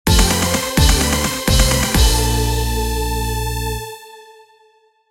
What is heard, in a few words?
Jingle Boss End; Gun Gun Pixies; PS Vita